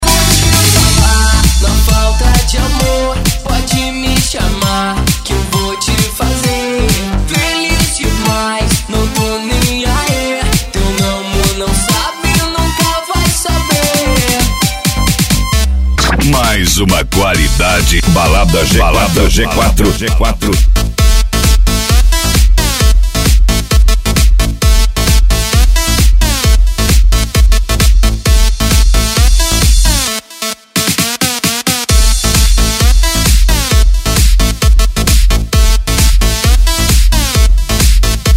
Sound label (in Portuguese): eletro house e eletro funk